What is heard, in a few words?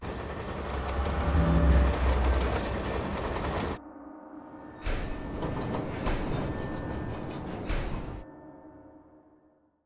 train boss defeated